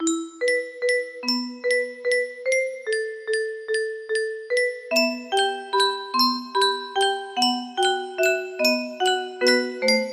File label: music box melody